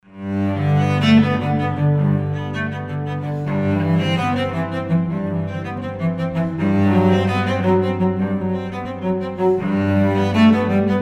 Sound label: suite for violoncello no. 1 in g major prelude; bwv 1007